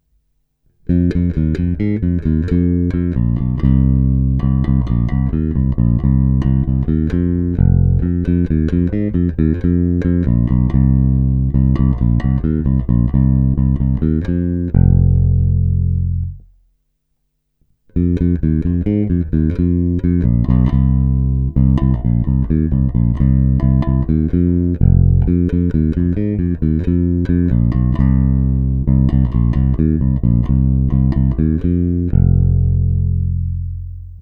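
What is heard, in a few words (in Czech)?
Bonusová nahrávka s postupně zavíranou tónovou clonou, nejprve plně otevřená, pak přibližně na půlku, pak skoro zavřená, a nakonec úplně zavřená, baskytara; Harley Benton MV-4MSB Gotoh BM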